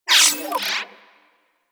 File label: digital glitch; Warframe; Corpus Railjack; Ambience